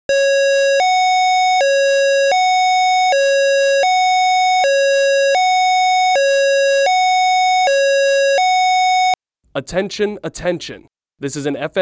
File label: Lightning Indoor